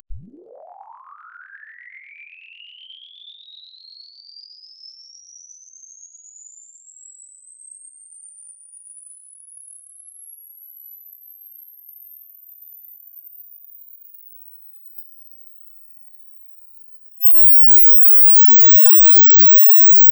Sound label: difference sweep